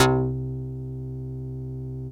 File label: ESQ, SYNTH; AMG; Global Trance Mission VOL-2